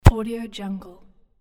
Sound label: kicking soccer ball; javierpaniagua